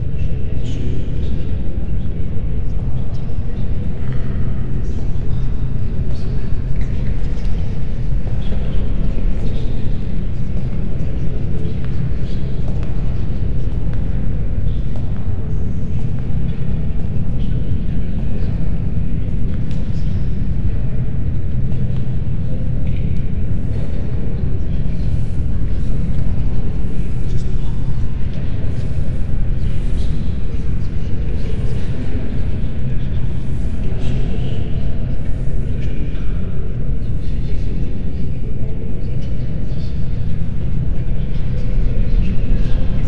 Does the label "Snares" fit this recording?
No